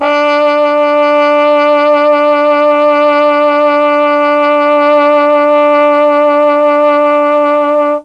D3 TROMBONE, mellotron; CHMB TRMBONE

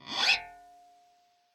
scrape, glowly bass

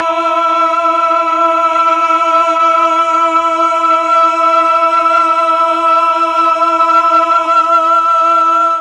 E4 8VOICE CH, mellotron; 8VOICE CHOIR

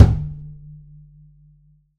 perc, pizz; meatbass

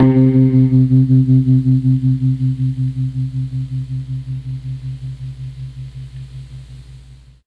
C2 GUITAR, mellotron; MKII

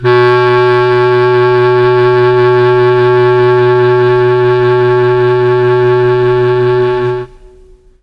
B1 clarinet, mellotron; CHM CLARINET

